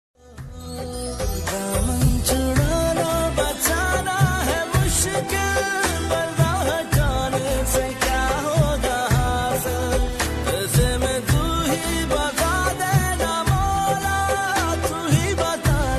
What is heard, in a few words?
Pakistani ringtone